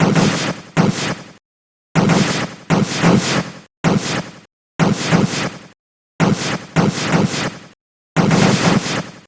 spade bomb; jevil

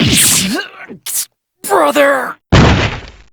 breakdown

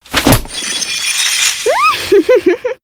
outfit change full

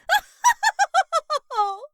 voice ohoho

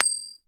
coin toss; megundal

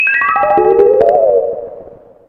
weird effect; deltarune

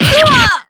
damage; weiss

